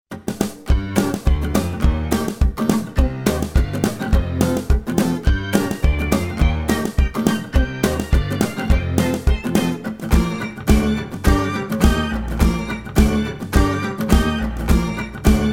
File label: Extended Instrumental